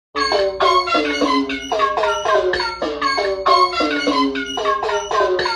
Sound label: beat